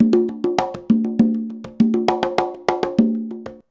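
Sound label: 133.5 CGAF, conga; Univers Sons; Basicussions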